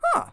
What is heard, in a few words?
Huh; asdfmovie